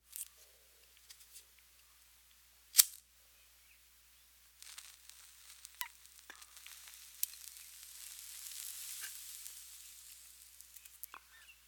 Human Cigarette Smoking

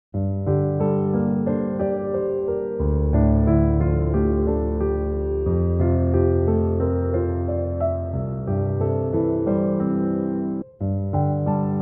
Cinematic Grand Piano Mess With Top

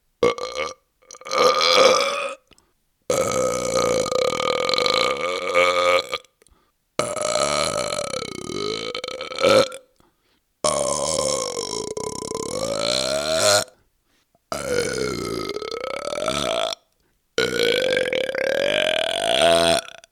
Burping, Riganje

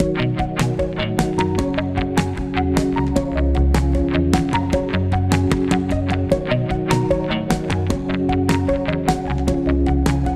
Little Adventure Loop

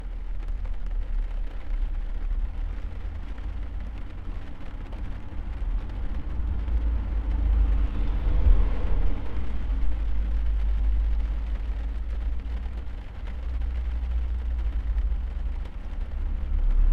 Rain From A Car

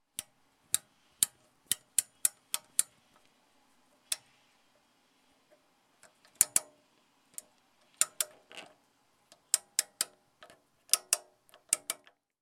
Knob Turning Stiffened Knob

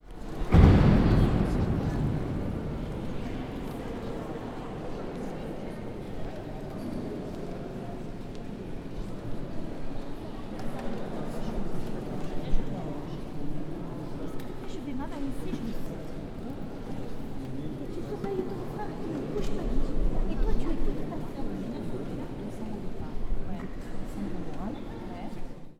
Church Eglise

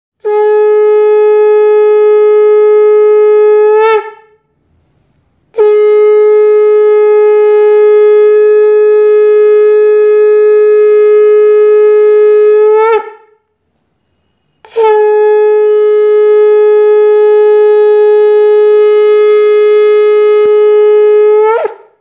Sankh Conch

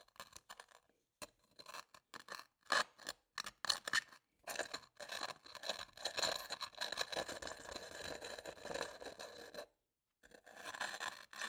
Grinding Glass

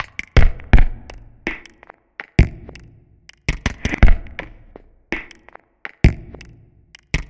Minimal Beatloop

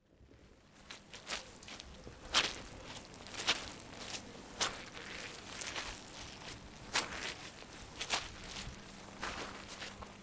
Feet Squelch

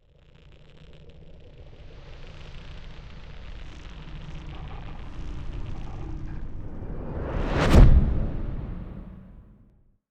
Riser Hit

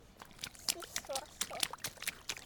Splish splosh splosh

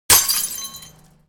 Breaking A Bottle

